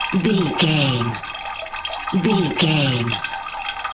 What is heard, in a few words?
water running dripping wee loop